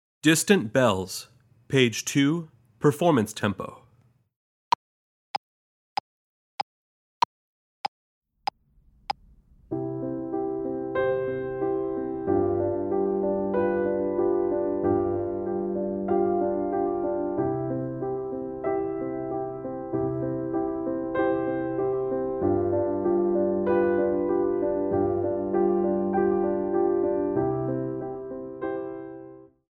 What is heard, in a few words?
Distant Bells, piano; Performance Tempo